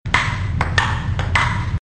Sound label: ping pong